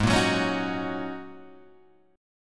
G sharp 7th Suspended 2nd Suspended 4th G sharp Guitar Open G